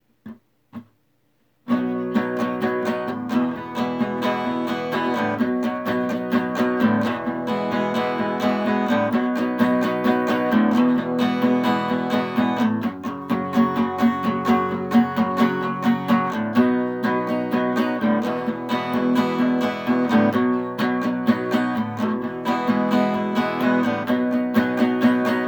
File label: acoustic; unita solar